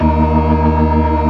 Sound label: ORGAN ALL STOPS; Keith Emerson Signature Series